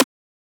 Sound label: Transient; FLPRO